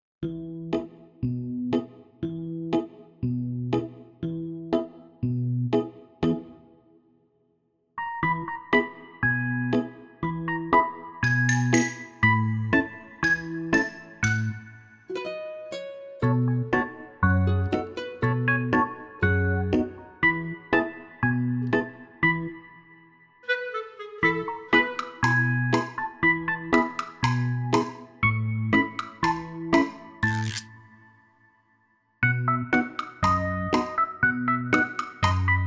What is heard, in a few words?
Bouncing Groove